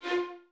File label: Violins F 4, String Ensembles; Miroslav Vitous; LINKS ORCH